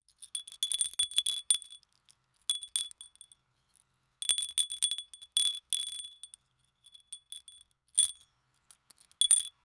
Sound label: picking up crystals; gleam